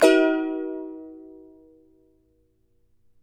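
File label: charango F minor up; Spectrasonics; Hans Zimmer Guitars VOL-2; CHARANGO CHD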